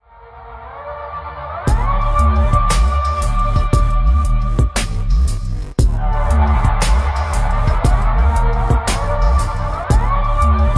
menu music

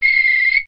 start whistle; Sports Games; BasketballPractice

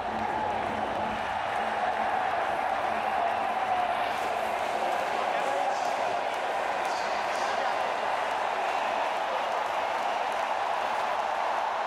cheer; SuperCricket

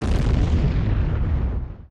boom

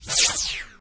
light sound; LightRays; Action Games

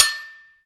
tap; CounttheCards; Fun games

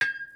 post hit; Kids Games; DribbleKingsFootball

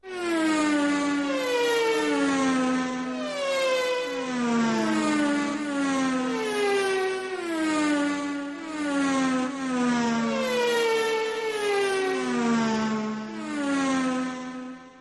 music background; Karting

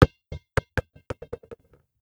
falling ball; FootgolfEvolution

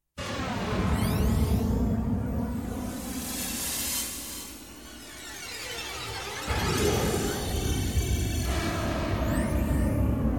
airplane; MazeAndTourist; Kids Games

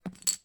chips handle; BlackJack

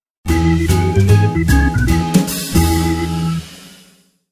level end; Sports Games; BasketballPractice

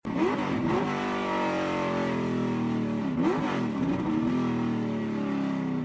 car deccelerate; MaseratiGranturismo; Racing Games

